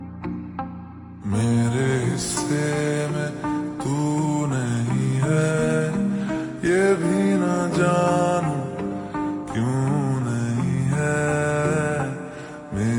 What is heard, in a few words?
Slow Reverb Version Ringtone